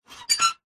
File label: industrial lever pull heavy screech